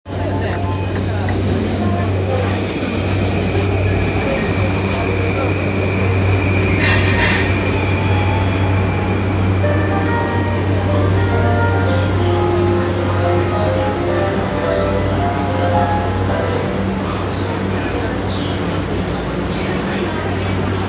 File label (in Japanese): JR 山手線 日暮里 CCW, train sounds